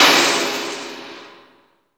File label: ELECTRIC; AMG - Vince Clarke Lucky Bastard